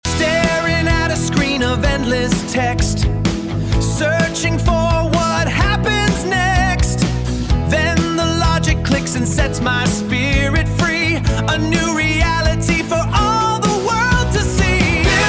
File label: coding song